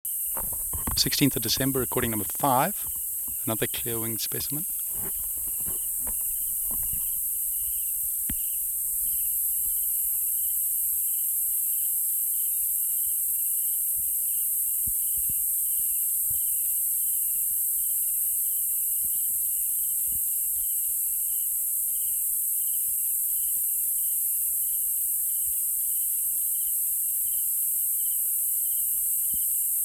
B. brevis, Morningside Ranch

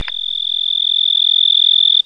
Oecanthus pictus

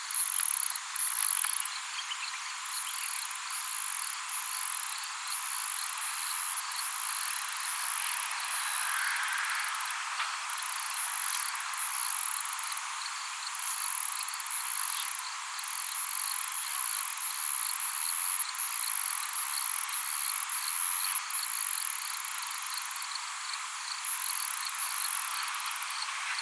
Myopsalta xerograsidia; Surat

